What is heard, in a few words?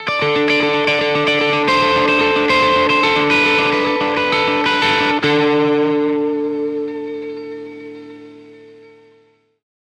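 Electric Guitar Rock Arpeggio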